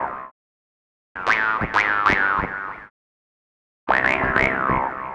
Wah Synth; Sonic Foundry (Sony Creative Software) - Stylus Pressure Urban Grooves on Digital Wax; Alien Tribe

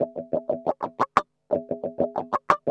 GUI 90 LICKS

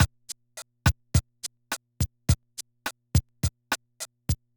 Loop, classic machines, rpm 40

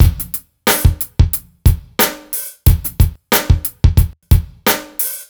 Dry Funk Kit, Drums Beats

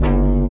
LIQUITAR; Spectrasonics; Metamorphosis

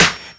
SD, dance drums hip

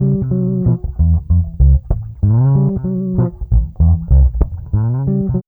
BASS, Funky Ass Loops; East West

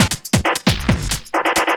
LOOP, Drum & Bass, 135 BPM

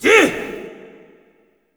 MALE CRY, Heart of Africa; Spectrasonics; Heart of Africa 2; S.MALEAMB

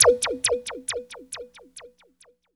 END, Tekno Industrial; East West

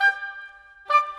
Flute Oboe, Orchestral; Sonic Foundry (Sony Creative Software); Orchestral VOL-2

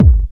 bassdrum; Ueberschall; Jam Box

